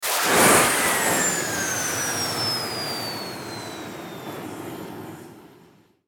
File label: Jet Pack Power Down